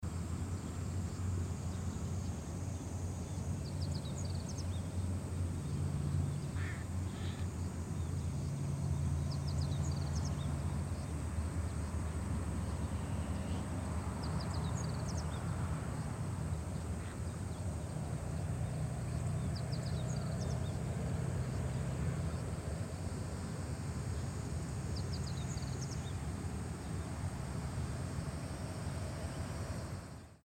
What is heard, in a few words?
muddy flat